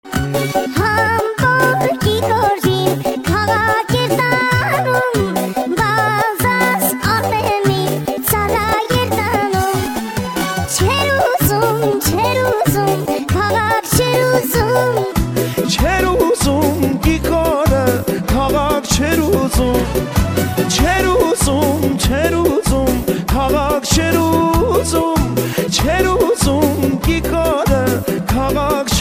Armenian; Giqor

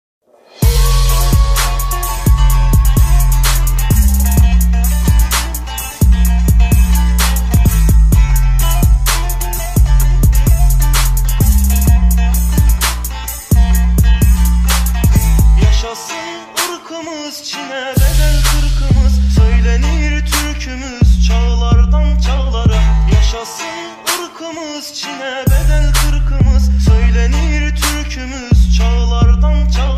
Trap

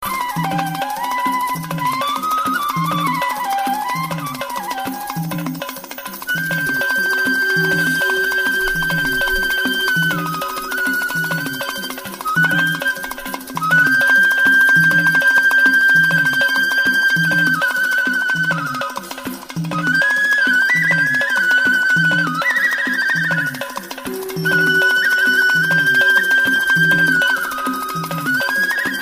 Flute Tone

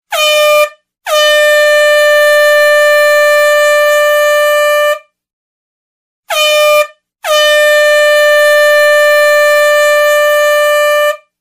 Air Horn

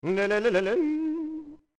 Singing

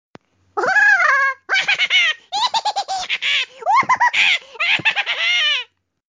Funny Laugh

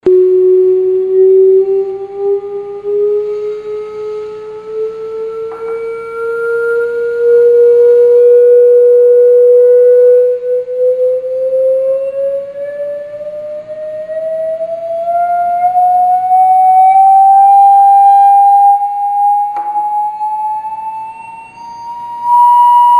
Noise

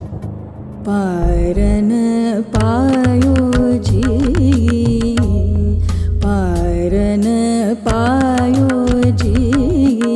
Soothing Aubhogi

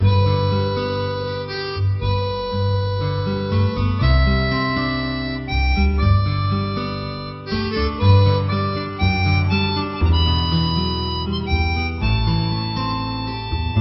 Classical

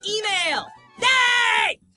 Email Yay

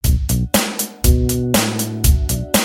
Test Tone

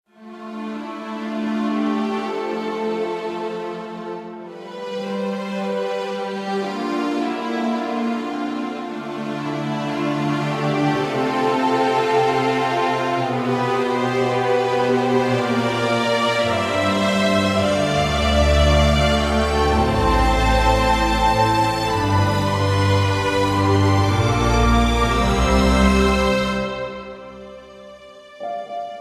Music

